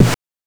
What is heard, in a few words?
BANK1 SD, NES DPCM; MIDINES